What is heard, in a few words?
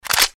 weapon cock